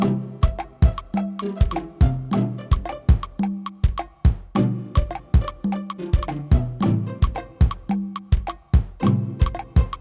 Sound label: piano; Impro sur Yamaha GDX 660